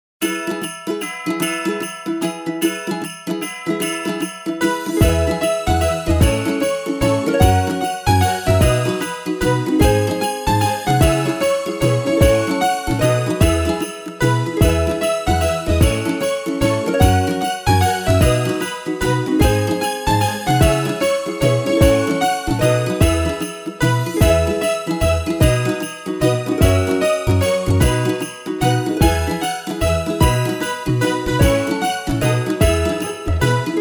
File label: we wish you a merry christmas happy remix background intro theme